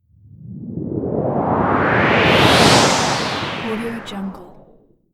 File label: noise pass by